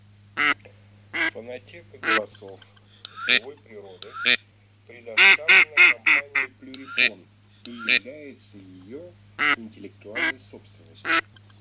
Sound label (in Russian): Серая утка Canapiglia; plurifon